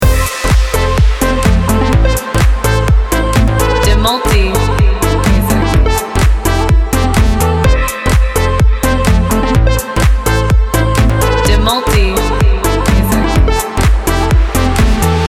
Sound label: instrumental edm; Track yang berani dan unik, penuh dengan efek elektronik tak biasa. Suaranya memberi rasa liar tapi stylish